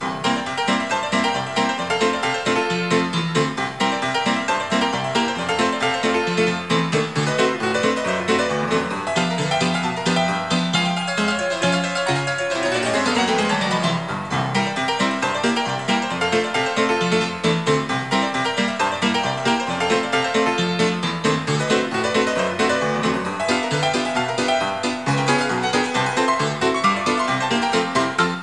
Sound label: Saloon Piano